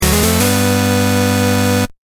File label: alert 25 cents; Samsung SGH-T839 Sidekick 4G